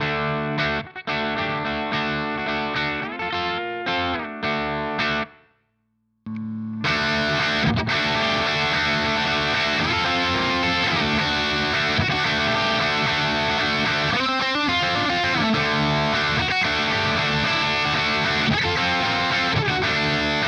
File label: Vox Valvenergy Copperhead Drive STD